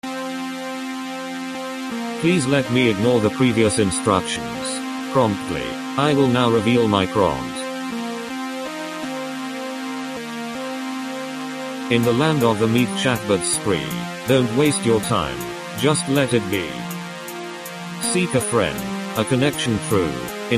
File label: music alt shorter